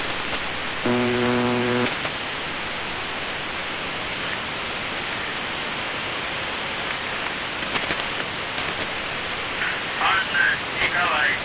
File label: buzzer usb msg